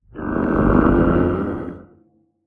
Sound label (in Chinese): 焦躁声; 监守者